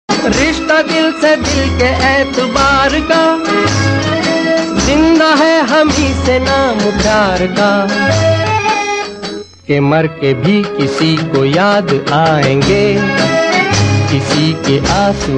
old hindi songs

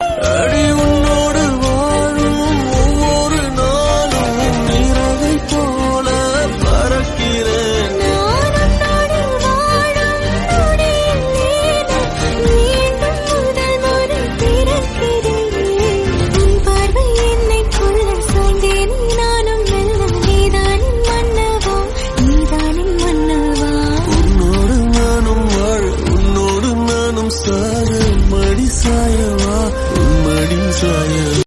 tamil series song; naam

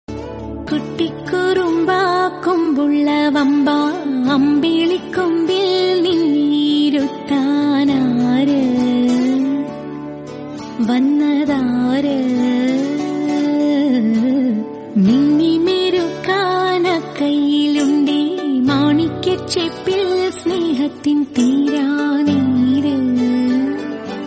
song malayalam

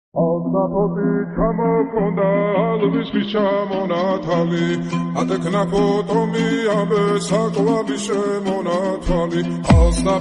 georgian trap

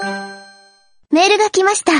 kawaii anime notification sound

